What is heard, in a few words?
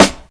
noisy snare sound D# key, Royality free snare tuned to the D# note. Loudest frequency: 2114Hz; snare drum samples